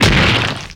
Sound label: hit; MonsterHunter; Fight